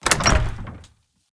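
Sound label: doors wood single light open; skyrim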